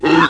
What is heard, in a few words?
seal; PUZMANIA